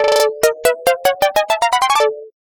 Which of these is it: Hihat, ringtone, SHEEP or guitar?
ringtone